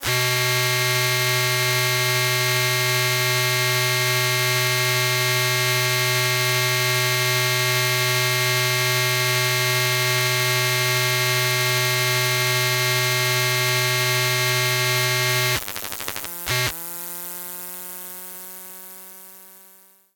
Cnc Wood Carving Machine, Electromagnetic Fields; Soundbox Library

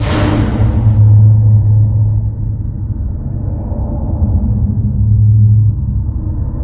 over tunnel loop; hl2

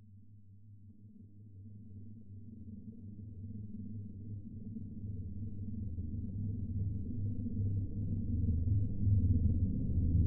passby Space Ship Big Cargo; SoundMorph; Solar Sky

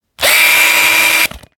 Drill Burst, Rev, Mechanical Tool, Electric; Drill - Foley & Bursts; Jake Fielding

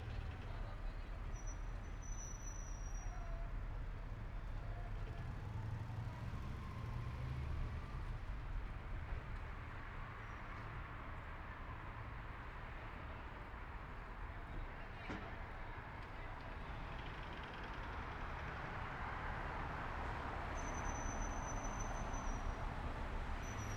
Balcony Mainstreet Top-Down Night Traffic, Madrid; Systematic-Sound; General Ambience Series; Sounds Of Madrid 01

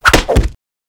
Hits, Hong Kong Action Kit; NoizBoy; Hit Kit #2

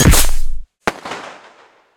steampunk weapon flare shot explosion; BluezoneCorp; Steampunk Weapon And Textures

